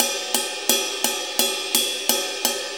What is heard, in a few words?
4-4 Ride Loop; 4-4 Drum Loops; HiHat Ride Loops; Drum Tools